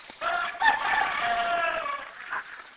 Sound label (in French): coq